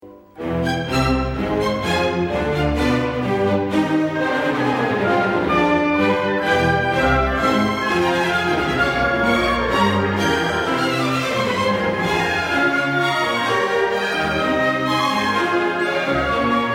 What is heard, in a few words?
Symphony